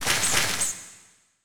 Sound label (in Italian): Morso Ratti